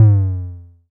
low floor tom drum sound f sharp key, tom drum tuned to the F# note; tom drum samples